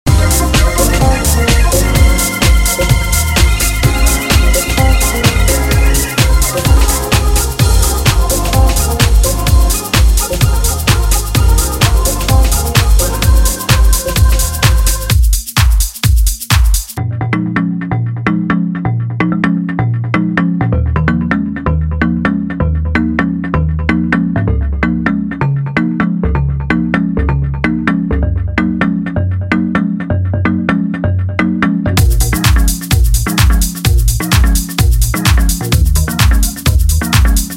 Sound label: kalimba